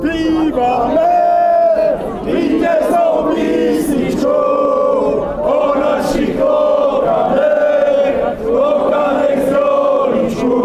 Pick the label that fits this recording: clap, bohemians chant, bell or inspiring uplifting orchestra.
bohemians chant